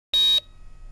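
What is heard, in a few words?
heart monitor, hospital; ambience; Soldier Of Fortune II Double Helix; XBOX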